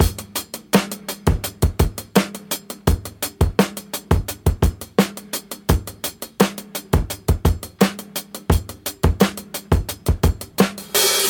114 bpm breakbeat e key, Free drum loop - kick tuned to the E note. Loudest frequency: 3849Hz